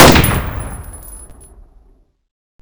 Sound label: fire; HL2-Nerv; Fisher